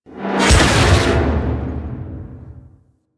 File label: Ram Intrusion Close; Hazards; Warframe; Railjack